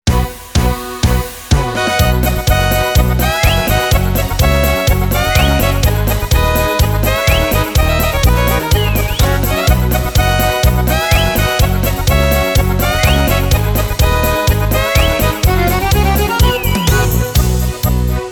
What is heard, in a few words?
Folk Party